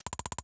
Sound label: ui dialogue scroll loop; Academy; ui VN dialogue scroll loop